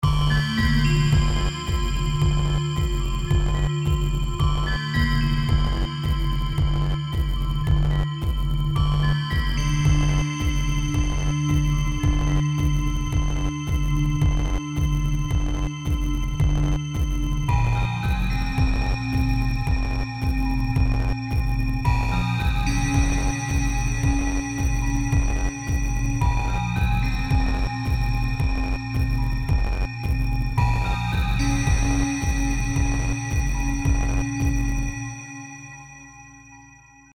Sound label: 110bpm